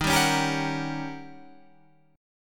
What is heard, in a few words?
D sharp 7th Sharp 9th Guitar Open F; D#7#9; strummed